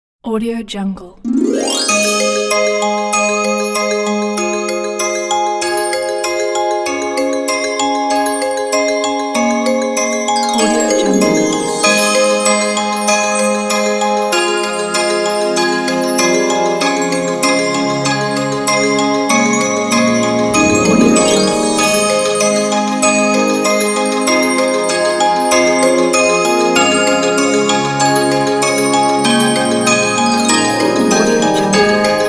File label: christmas night